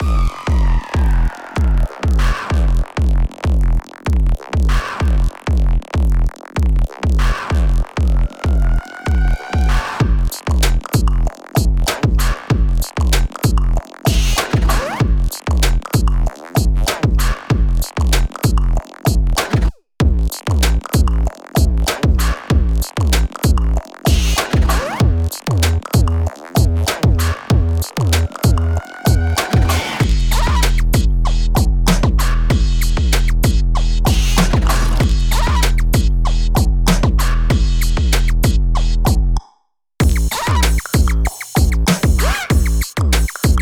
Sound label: Gay Instrumental; Friday Night Funkin' OST